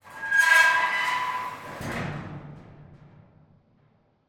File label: Dumpster door, from inside, door, slide, rusted, screech, grinding, resonant, light; Eiravaein Works; Vaeyan IV